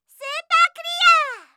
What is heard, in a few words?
superclear